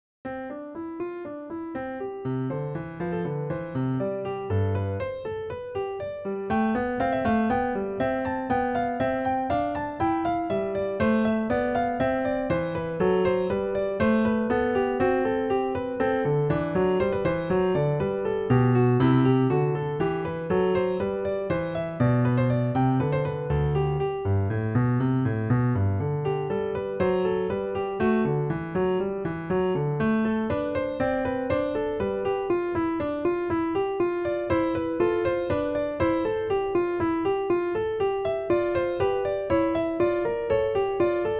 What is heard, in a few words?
Grand Piano Model D